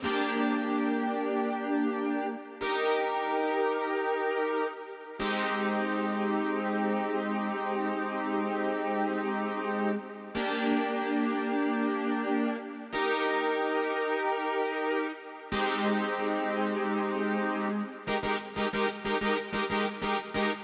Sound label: synth, Smooth RnB 093 Ebmaj